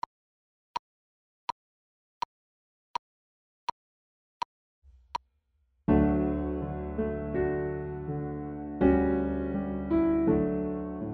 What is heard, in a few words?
Largo 41BPM Backing Track